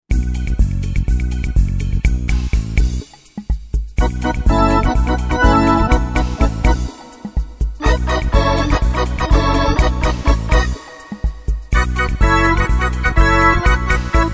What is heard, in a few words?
Hammond